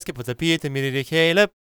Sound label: GAELIC, World Voices; ILIO; Vocal Planet